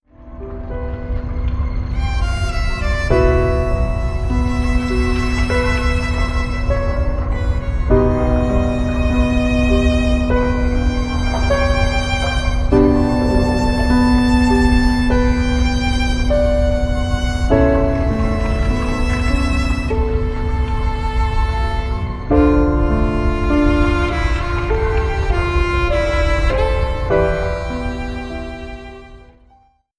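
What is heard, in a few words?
background music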